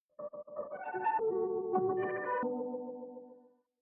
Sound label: 97 BPM E Min; Cymatics - 2022 Melody Mystery Expansion; Sleepless Night; Thermae